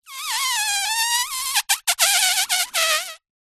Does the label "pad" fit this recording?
No